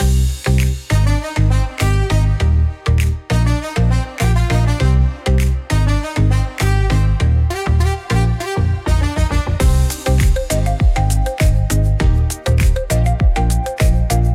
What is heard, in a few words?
Pop